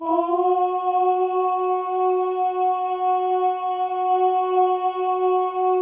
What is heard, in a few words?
male ohs; Spectrasonics; Symphony of Voices